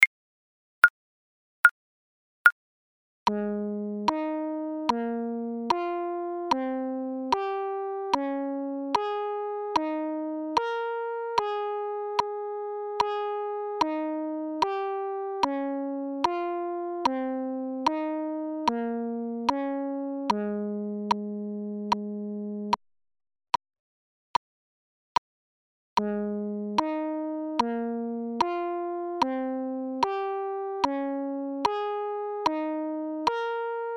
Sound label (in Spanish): Entonacion Intervalos Escala Mayor Quintas